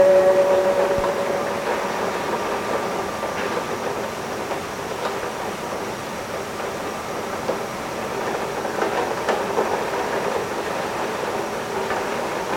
rain chord

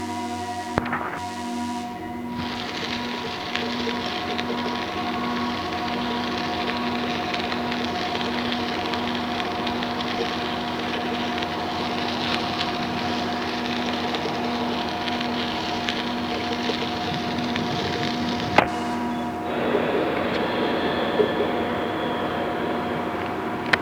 bike drone

